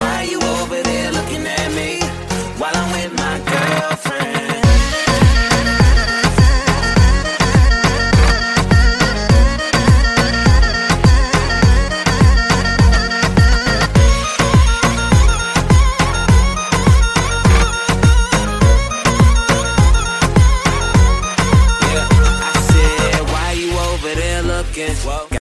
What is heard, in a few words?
california flute